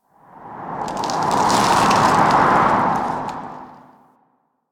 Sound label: Tower Rustling Leaves; Ambience; Warframe; Quests; The New War; Cetus; Unum Tower